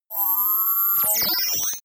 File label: interface calculate